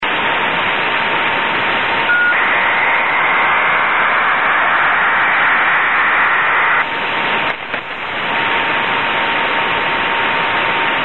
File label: HF Acars